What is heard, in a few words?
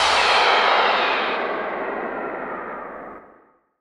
NOIS; XXL 800 Production Effects